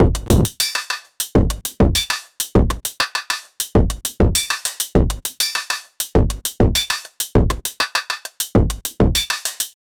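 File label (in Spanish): beats y percusion, percussion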